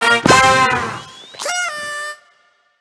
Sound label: defeat; Viking Escape